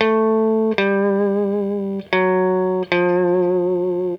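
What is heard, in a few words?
guitar, Roots Disco; USB Soundscan vol.32; AKAI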